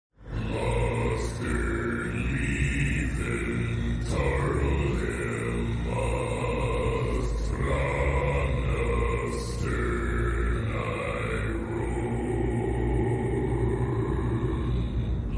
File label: Hell priest chant